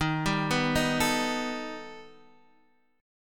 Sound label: B Augmented 7th D sharp